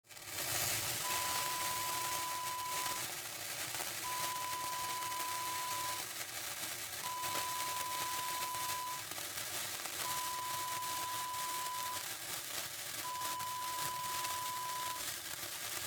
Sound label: fuse burning